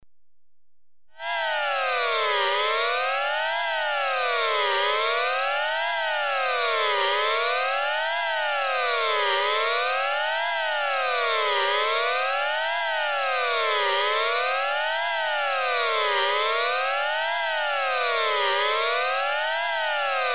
Synthesized air raid alarm, Electronically Generated Sounds; Sound Effects Library